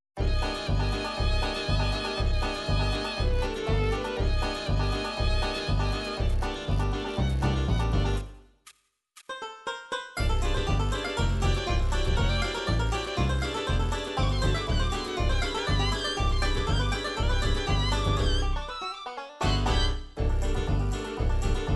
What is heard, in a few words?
bluegrass, midi